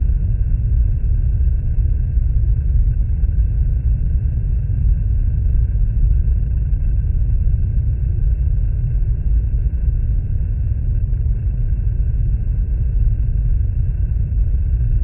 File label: titan ship hum; nemesis; Stellaris